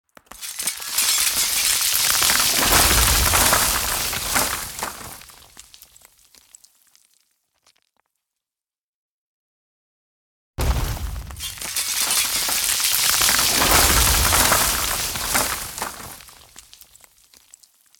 House Wall Collapse With And Without Demolition Ball Close Up